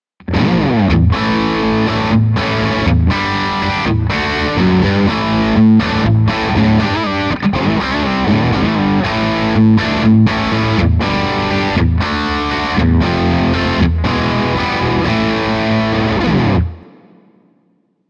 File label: crunch